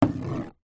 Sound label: foley stone scrape wooden surface; zapsplat